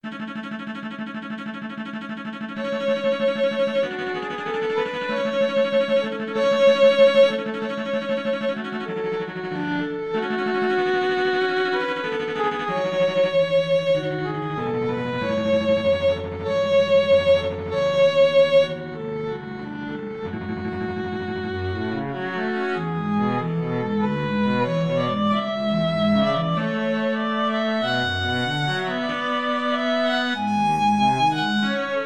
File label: violin cello duet